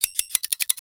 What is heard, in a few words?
high speed electr